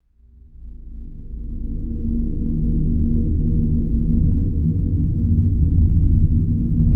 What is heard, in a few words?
resonant metallic drone swelling and fading